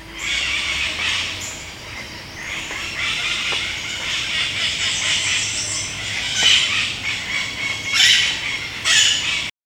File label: bird